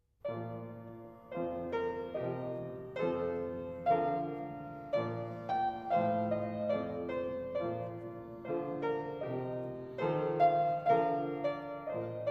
Bagatelles for Solo Fortepiano; Eric Zivian; Beethoven

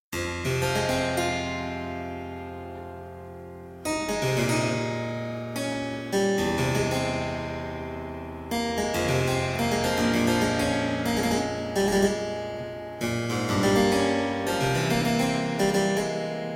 Prelude in G Minor, Harpsichord; Colin Booth; Louis Couperin Harpsichord Music

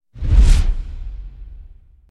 White Noise with Low End; Hollywood Edge Sound Designers Tool Kit

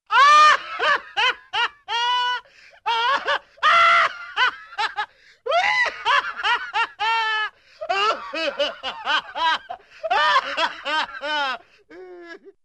Funny Man Laughs Hard & Steady; Hollywood Edge Cartoon Trax

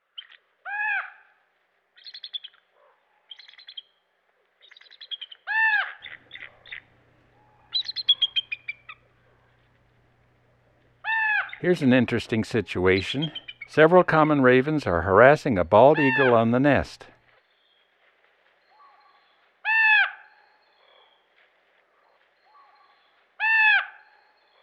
Common Raven and Bald Eagle; Western North America; Dan Gibson; Wildlife Identification By Sound

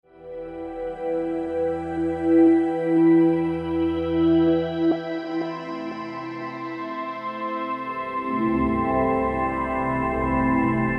Major Chords; Beds

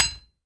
IRONWARE, IRON WARE HIT; 1000fx

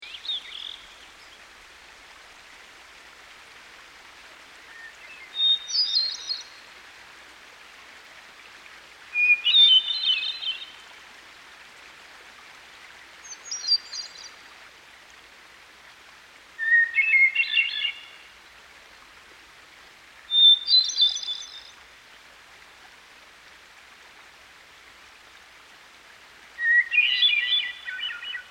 Hermit Thrush; Dan Gibson; Songbirds By The Stream

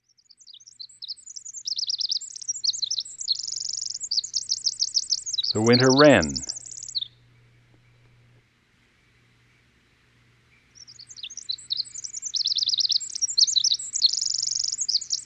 Winter Wren; Western North America; Dan Gibson; Wildlife Identification By Sound

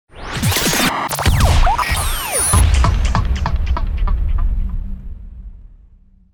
Bouncing Tuner; DJ sounds

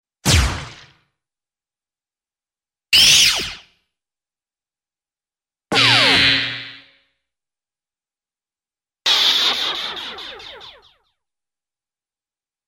Laser Sounds Glass Broken in water; Hollywood Edge Cartoon Trax

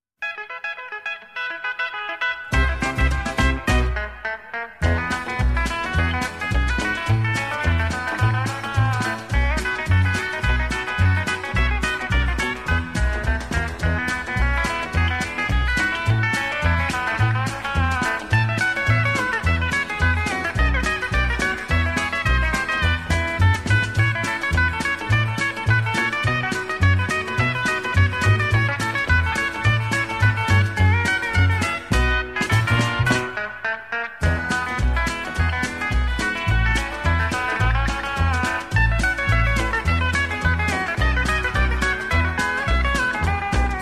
pedal

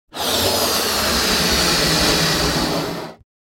Inflating Large Balloon, Household

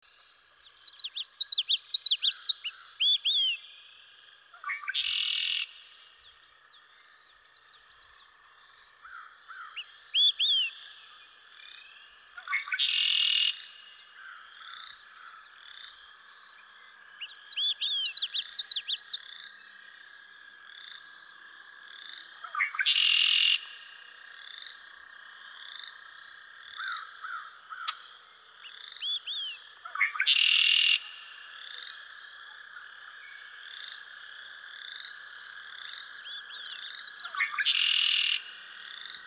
Red-Winged Blackbird

